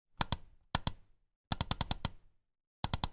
office computer mouse wireless miniture clicks multiple